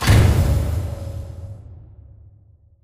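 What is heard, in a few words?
Prop Rack Start Distant; Prop Warehouse; Titanfall 2; Boomtown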